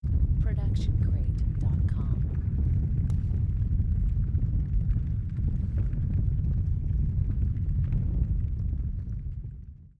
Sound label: Large Fire Distant; SoundsCrate